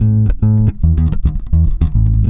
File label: A#, Bass; Spectrasonics; Bass Legends; 117 CORAZON